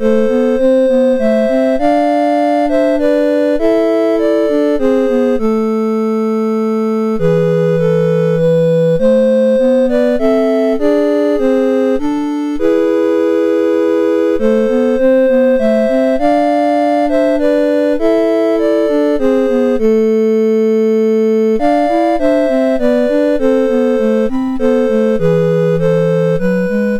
Lullabye, MOS6581R2

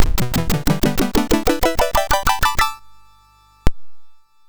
sid MOS6581R3

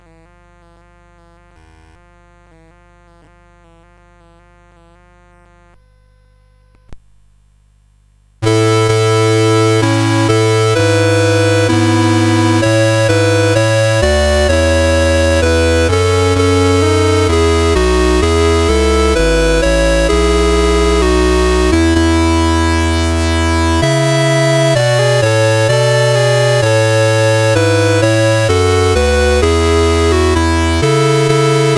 O Come all ye Faithful, CSG8580R5

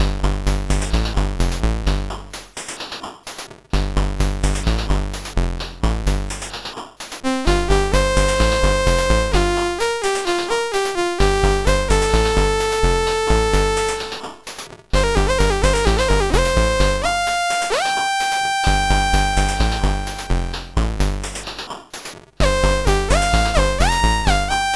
Improvisation on Ibexes, MOS6581R3

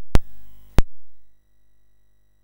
Commodore 64 Christmas BASIC MOS6581R3; Commodore 64 Christmas Album

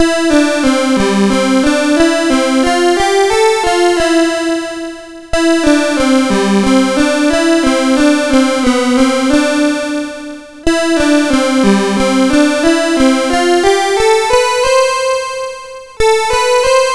Cosmic Chimes, MOS6581R2; DC Starr

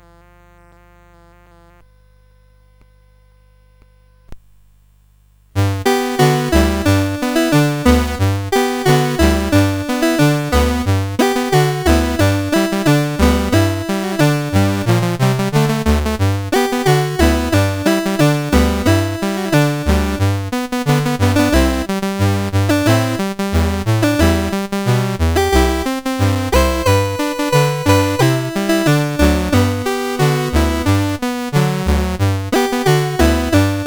CSG8580; CSG8580R5

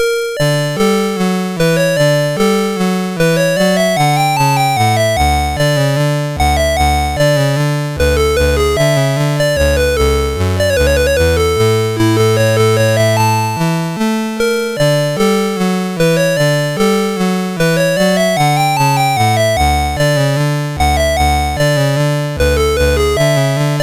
March in D, MOS6581R3

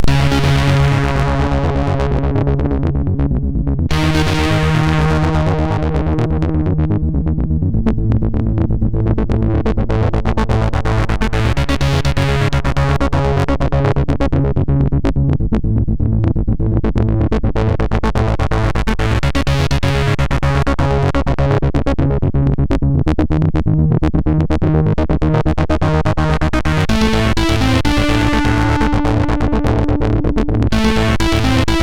sid MOS6581R4

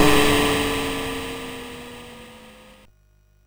Synth Sample, MOS6581R3

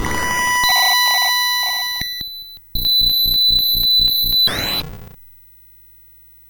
Musicbox, MOS6581R3; hvsc

